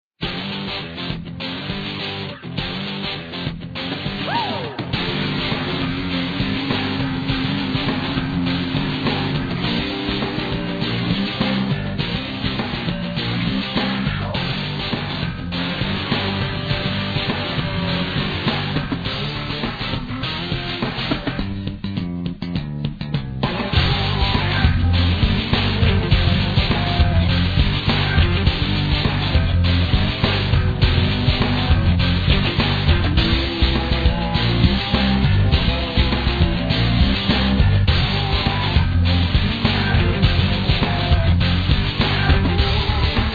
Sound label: music; groof runner